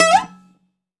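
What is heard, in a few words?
BZ GLIS UP E, bouzouki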